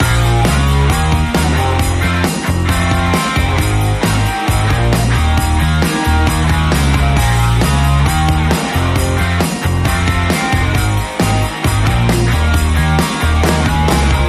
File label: Lofi Christmas Chill